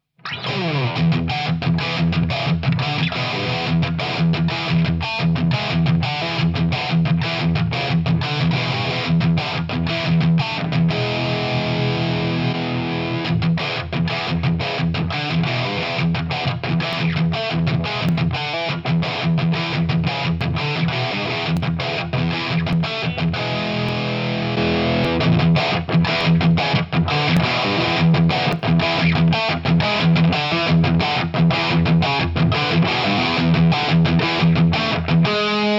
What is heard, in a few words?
Blackstar Silverline Deluxe Head Crunch